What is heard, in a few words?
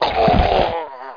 surv deth